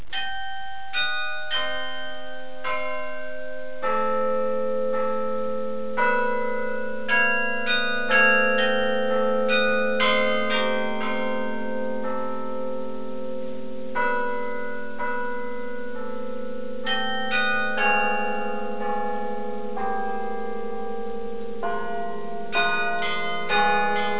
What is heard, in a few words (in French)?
carillon; Carillon de Saint Amand les Eaux